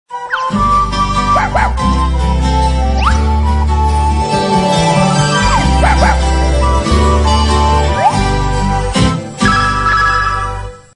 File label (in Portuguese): Plim Plim, Vinheta